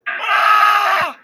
screaming

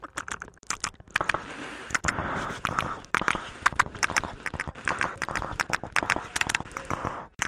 eating something

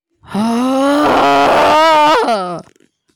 snore

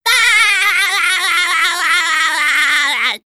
laugh; sponge

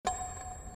bell zvukk

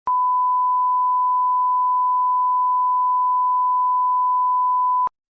beep tone

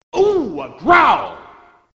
growl; pokemon

